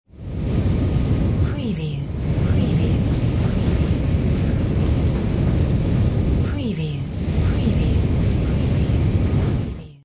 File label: sci fi magic fire loop; windy beach audio